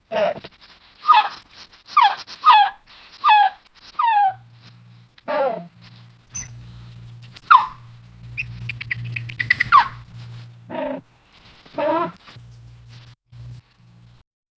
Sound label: a creature says the quick, chirps